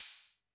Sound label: Hihat Open, Technics U90